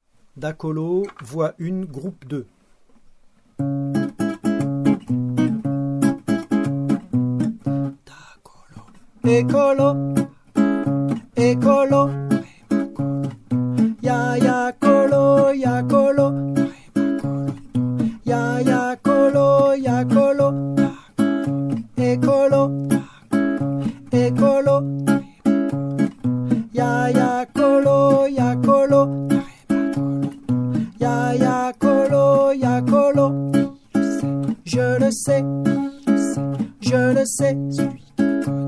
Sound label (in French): Voix